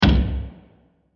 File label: Massive Hit With Reverb; Blasts; Impacts; Sound Effects:Impacts; CraftedSignals